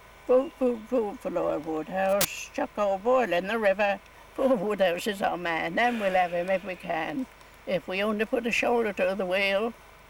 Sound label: elecsong, Nan once sang a campaign song